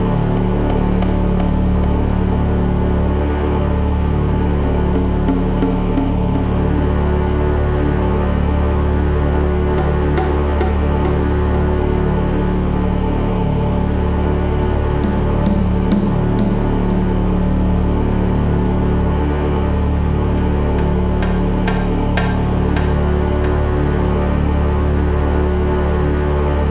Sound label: Music loop; Senet; nile